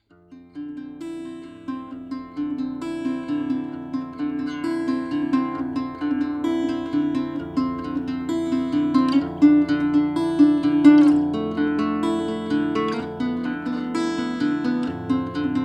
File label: Guitar Capsule Only